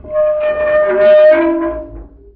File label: spin slow, spin trap; hl2